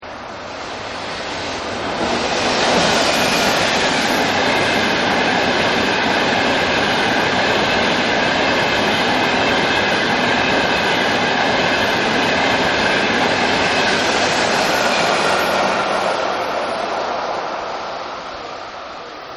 Train Pass; Trains; Vehicles; Partners In Rhyme

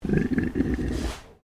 Animal Pig Landrace Inside Grumble; Edgar; Edgar's Mission Farm Victoria; Pigs; Animals

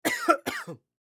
Human Male Cough; Sick Sound Effects; Sick (Sound Effects:Human)

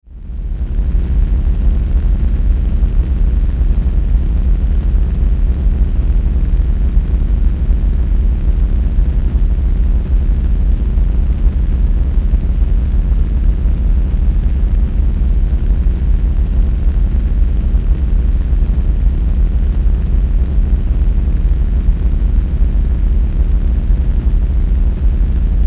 DRONE DARK REC, Drone Dark Record Static; Blastwave

